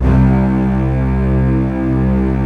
REAL ST C1 L, ARR STRNG

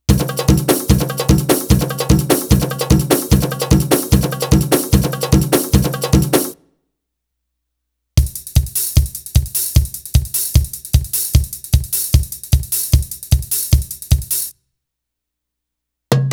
Arabic Rhythms

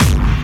hard bass drumz

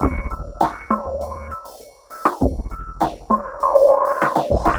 drloop, drumloops, Vinyl Attack; Masterbits

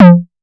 Borg Tom High, drum kit; Polyend; Stazma & Concrete Collage; Concrete-Dual Borg

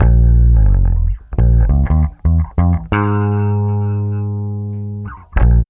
BASS, Funky Ass Loops; East West

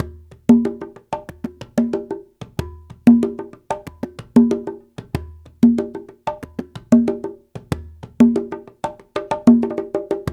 93, Latin Congas; African Contemporary; Spectrasonics; Supreme Beats

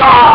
argh; Amiga Soundtracker Sample Packs

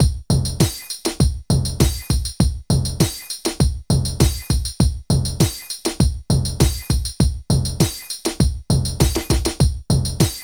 TECHNO, Funky Xtreams; INIS

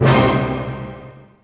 Orch Hit Min; Roland M-OC1

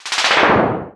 FX; Greytsounds VOL-1; TAILORED R8

